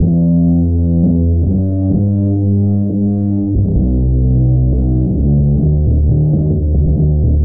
bass, Loops

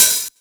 Electro Closed Hats

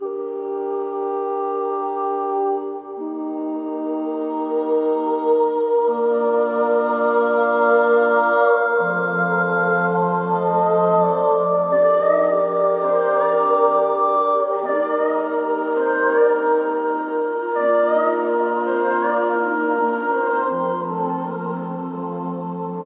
voice zombie; Loops; Sad

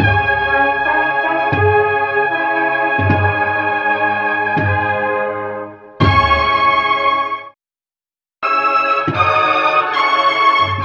horns, phonky loops

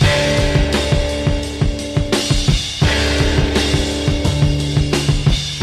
ringing tones, drum loops boombap

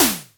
Toms Electro

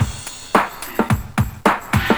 garbled, funky drum loops

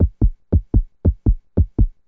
heartbeats, drum loops

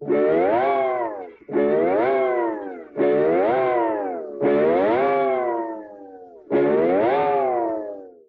jumps

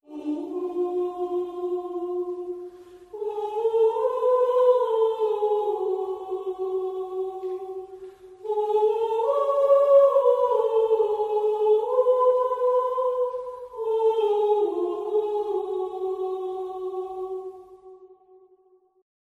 voice; Sad

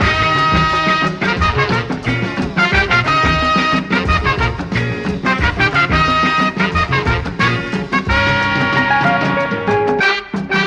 horns, Phonky loops

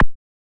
hiccup, Misc Percussion, Ticks

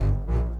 bass, Loops

